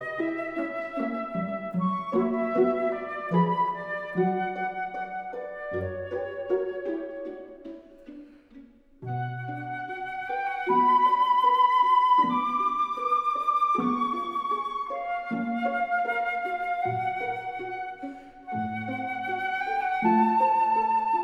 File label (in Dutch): Adagio (fluit kwartet); Mozart; K 285